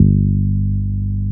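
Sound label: BASS GUITAR; East West - Phat & Phunky CD2; 90's Sample CDs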